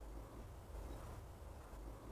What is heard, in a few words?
ambient